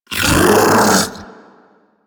Infested Splitter Joined Vocal Attack Medium; Warframe; Grey Strain

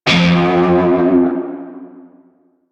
Shawzin Flourish Oct One F; Music; Warframe; Narmer Shawzin

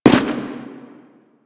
Step Default; Warframe; Quests; The New War; Veso

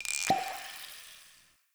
Healing Potion Pickup; Objects; Pickups

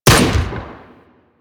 Thumper Bot Shotgun Fire C; Warframe; Grineer

